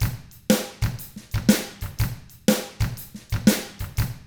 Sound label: SHUFFLER, Drums; ILIO; Double Platinum Drums 1; 122 SHUFFLER